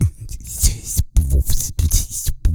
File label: EH HIP HOP, Vocal, Blues & Soul